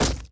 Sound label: Rope Cut